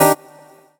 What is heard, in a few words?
Chords Tones; Equipped Music; Loungin House